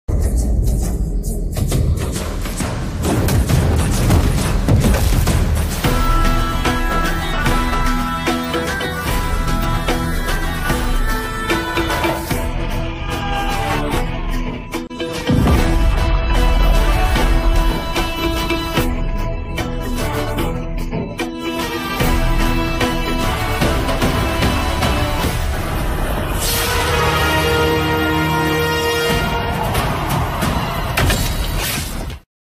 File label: trailer bgm; Chiyaan Vikram